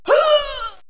die civilian

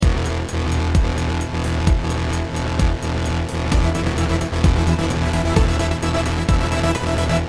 End Sound; freaky clown town mystery windows 2020

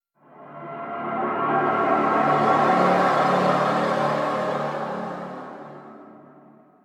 Stinger Decrease Action

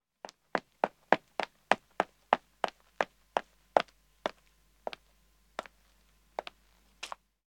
Footsteps Pave Run then Walk; The 39 Steps

